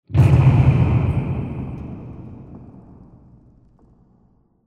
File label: Bomb Explosion Big; بمب و نارنجک